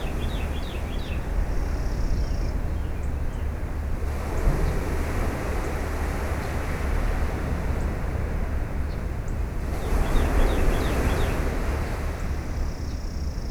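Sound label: morning birds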